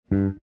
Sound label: Bass